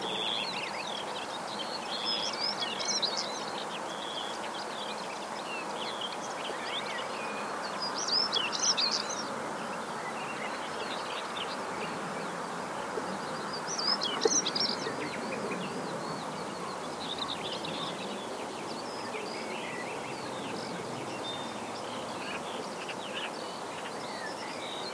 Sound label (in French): tarier pâtre faisan